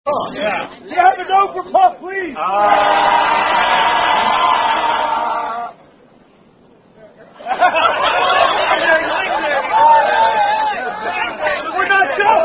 Sing Me A Note; Shiggy Shaggy; Screwed Up Song Penalty; Sucking Up Spouse

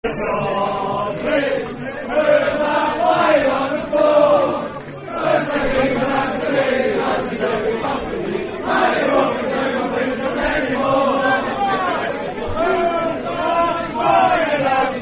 Football Chant (Soccer Song), Aberdeen; Who's That Lying At Pittodrie; Aberdeen Afc

